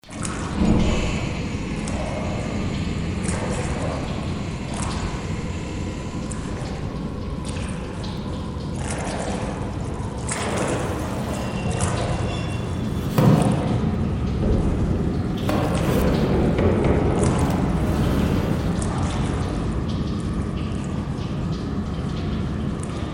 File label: House Ambience